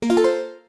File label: Pause Menu Select; Select 'Save' or 'Options' in the Pause Menu